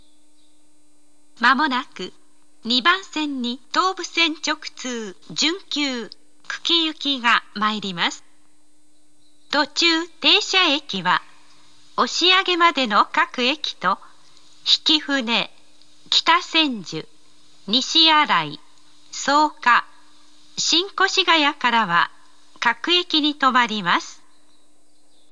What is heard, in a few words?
semi express, shibuya station; kuki